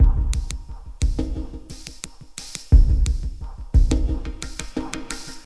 Ambient Deep; Blockland

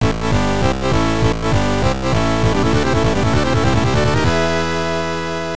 Win Match; Nekketsu Koukou Dodgeball Bu PC Bangai Hen; TurboGrafx 16

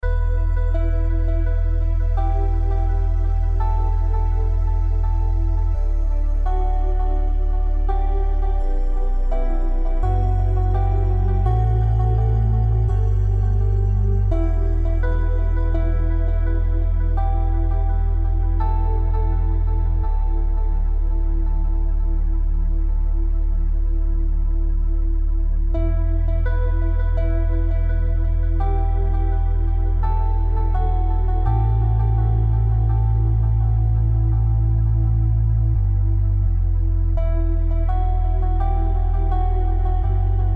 Loading Menu-Screen Theme; Space Station Sprint; Windows Xbox One Xbox Series X S; One Man Symphony

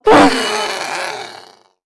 Whistlers VO Death; We Are the Dwarves

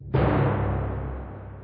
Creep Tymp

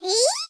Candypet Cute Dragon Happy; candy crush saga gamerip

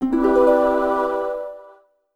Jingle Microgame Clear; WarioWare: Smooth Moves

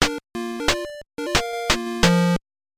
Reinforcement Jingle; North & South; NES

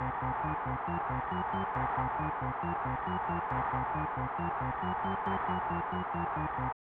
Organ Theme

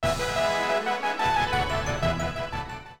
Jingle Levelup; New Chuugaku Eitango Target 1800 DS; NDS

